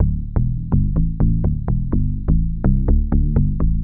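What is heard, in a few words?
synth bass, house, 125, A; eJay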